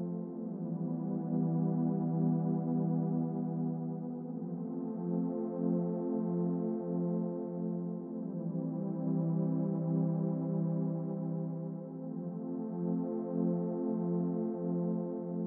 stem chords, music loop; anvil

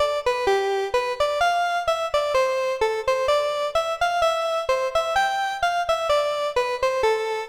stem lead, music loop; vellum

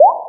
pickup, sfx; vellum

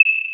ui hover, sfx; prism

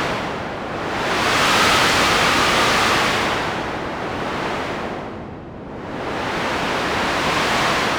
wind, ambience; anvil

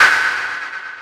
step, sfx; anvil

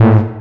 ui error; rust

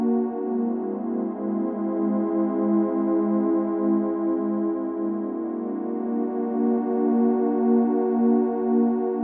stem chords, music loop; prism

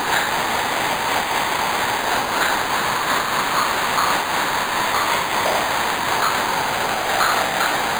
crowd, ambience; arcade

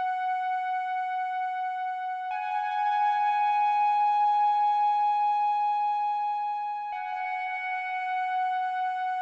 stem bells, music loop; prism

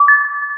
ui confirm; prism

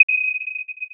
ui hover, sfx; prism

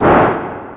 whoosh; rust game

